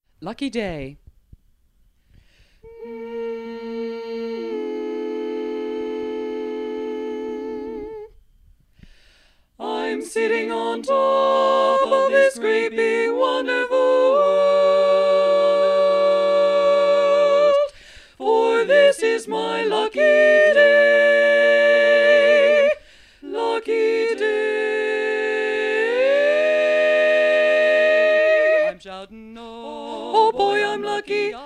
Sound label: Tenor; region17online